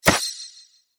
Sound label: Archery Magic Shot, Bow And Arrow Sound Effects